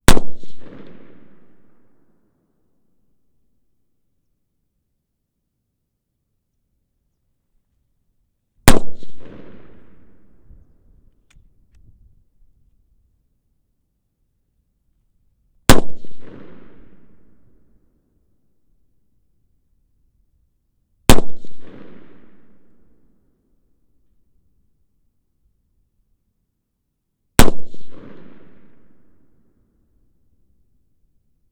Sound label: MOSIN-NAGANT 1m close front right shots; FLYSOUND